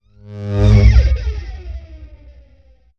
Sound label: Shock Wave Synthesis